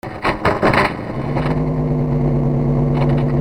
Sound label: INDUSTRY